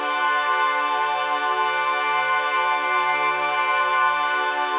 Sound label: Pad Loop D, shimmer and sparkle; Pad Loops